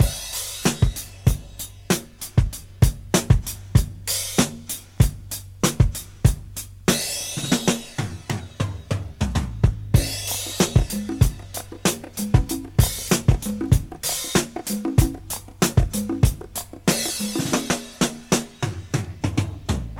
96 bpm drum loop sample G sharp key, kick tuned to the G# note